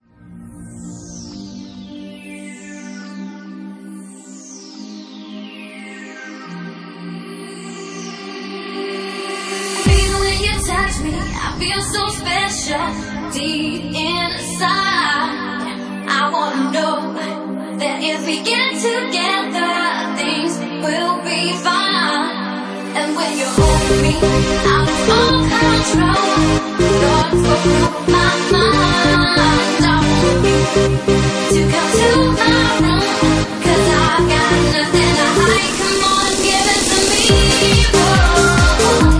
Organ Mix

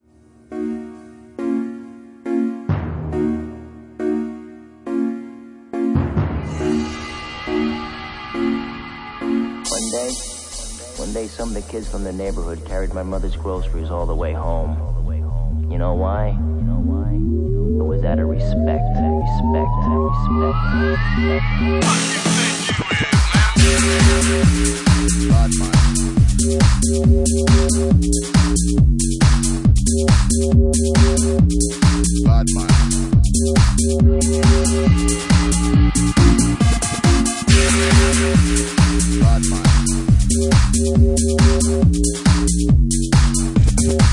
Reggae Dub

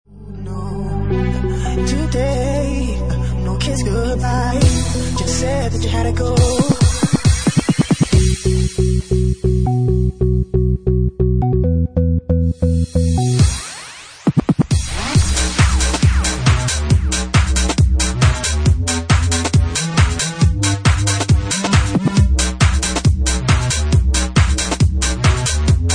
Bassline; Bassline Meltdown Session 1

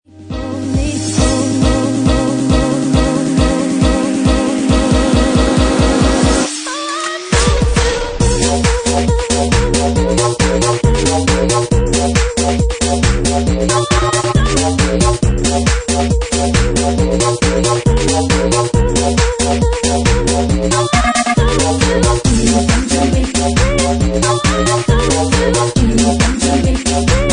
Bassline; Bassline Meltdown Session 1

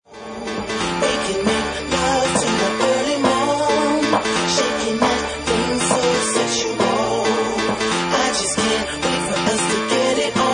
Organ Mix

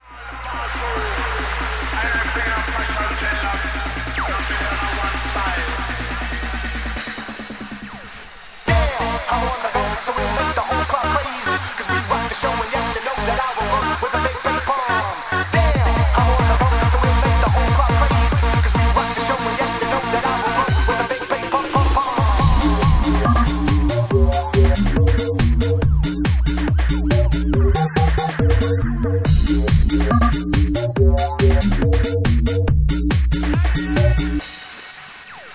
Big Bass Bomb

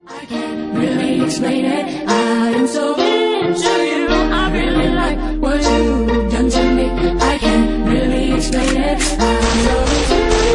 Bass Package

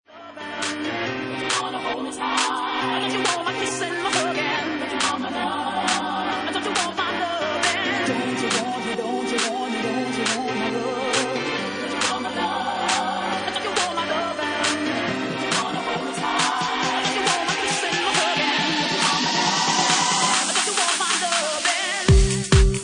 Twisted Bass; Bassline House at 137 bpm

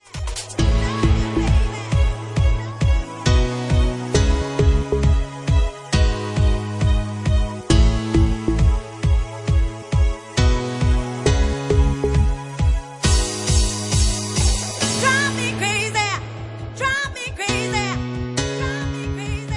Organ Mix

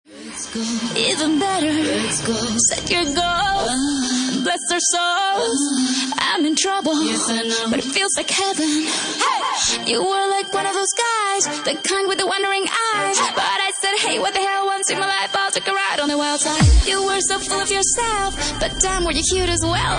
Organ Remix

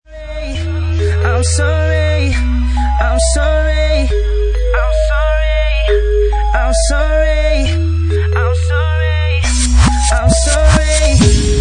Original Organ Mix

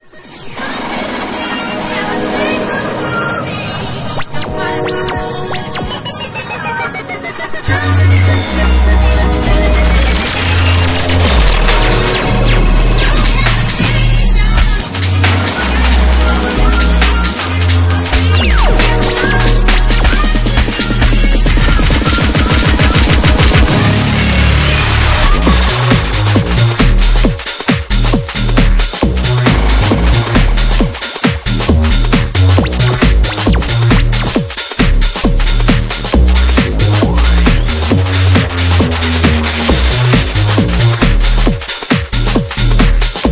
Speed Garage Mix

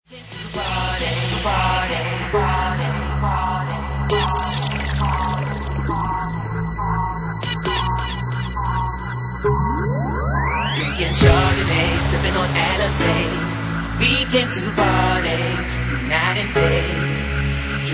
Organ Mix

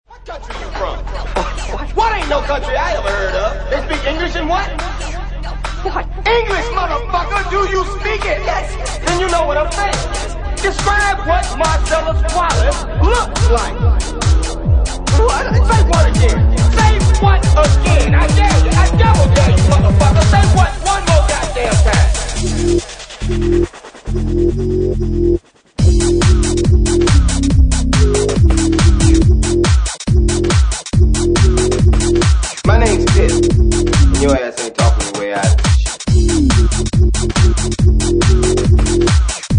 Bass

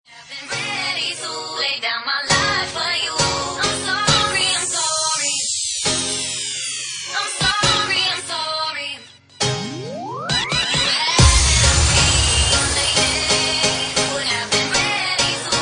Organ Mix

